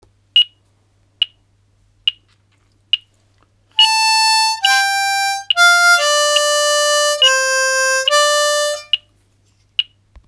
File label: Third position descending